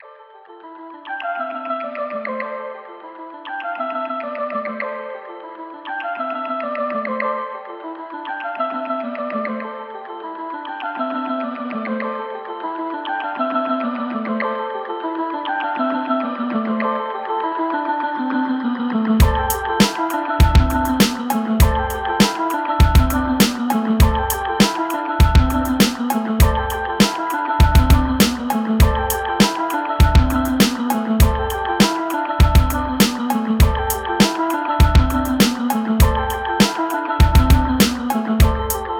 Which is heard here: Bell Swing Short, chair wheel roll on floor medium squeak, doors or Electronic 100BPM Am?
Electronic 100BPM Am